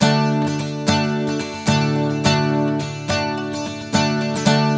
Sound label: Blues Guitar Samples; ILIO; Fretworks; 108 NATL RIFFS